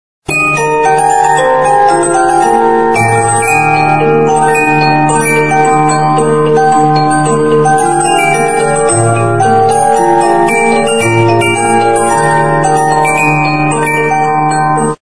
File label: Ringtone